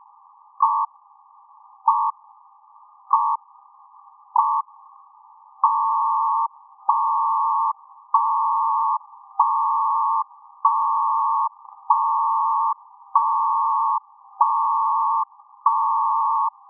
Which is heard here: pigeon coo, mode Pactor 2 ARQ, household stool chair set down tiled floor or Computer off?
mode Pactor 2 ARQ